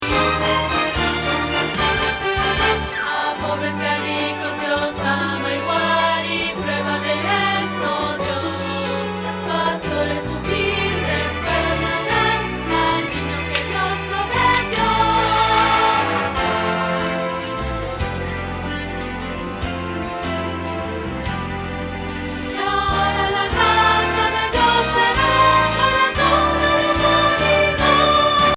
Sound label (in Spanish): Contralto